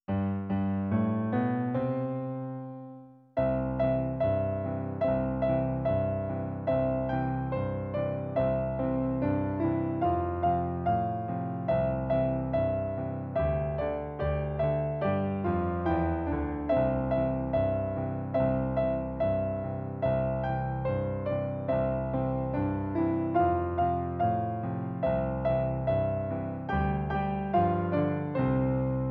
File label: JINGLE BELLS DUET